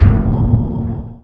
ground; Piranha

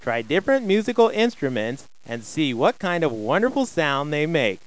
MUSIC

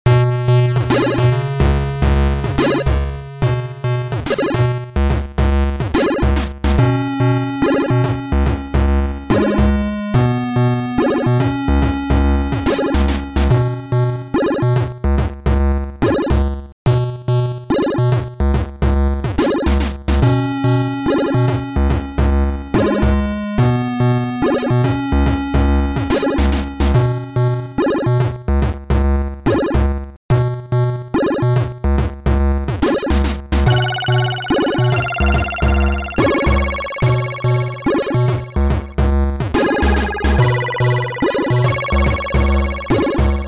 YM2149; YM.ChaosEngine; YMST Module

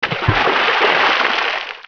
h2o jmp; Hexen2; player

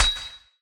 symbol hit; Geschicklichkeit; Bricks Of Egypt

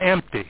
empty

